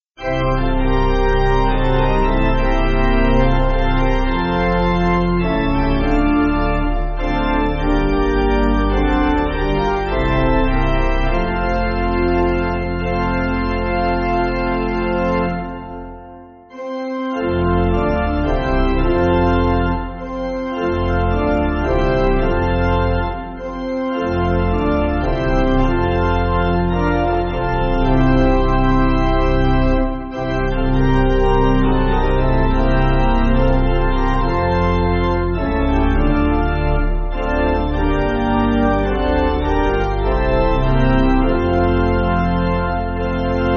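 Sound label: Pipe Organ, Backing Files for Churches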